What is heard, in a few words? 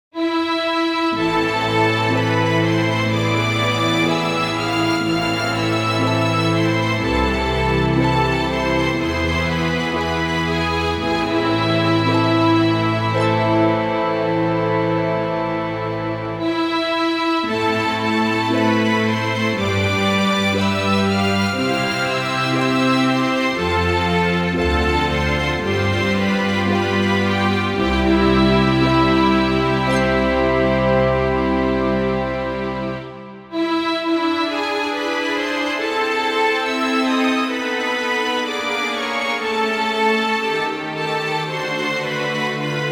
orchestra; grieg; solveigssong